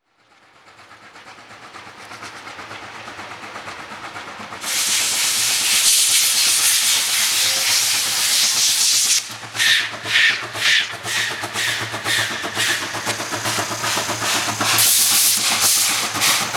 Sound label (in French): locomotives a vapeur; sonotheque